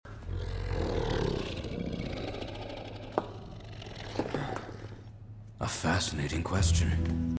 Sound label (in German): viech; final fantasy vii remake